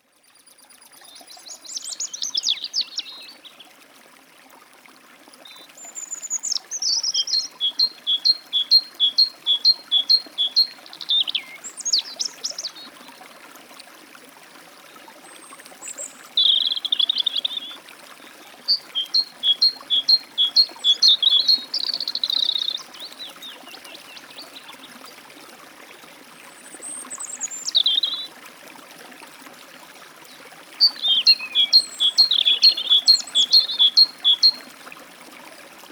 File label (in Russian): bolshaya sinitsa i zaryanka u lesnogo ruchya